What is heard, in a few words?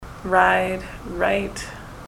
ride right